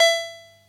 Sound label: Synth Shot; Vengeance Samples